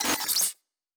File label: Glitch, Electric; Sci-Fi Sounds